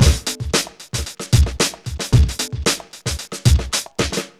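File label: 113 bpm, Funky loops; Zero-G; Funky Elements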